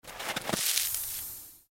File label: Dead Rats; Blind Quest - The Frost Demon